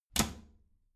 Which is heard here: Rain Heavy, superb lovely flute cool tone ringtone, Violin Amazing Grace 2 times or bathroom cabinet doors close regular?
bathroom cabinet doors close regular